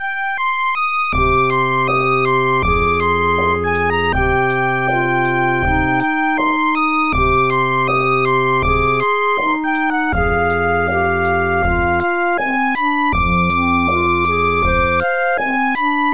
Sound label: keygen song